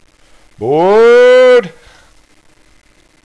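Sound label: Door Close, Train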